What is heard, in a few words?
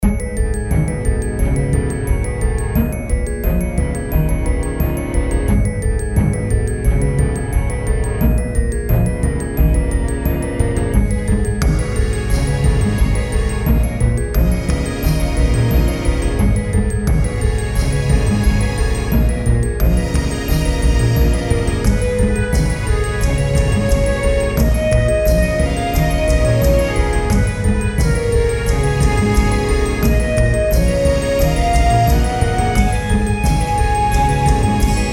Tinker Orchestra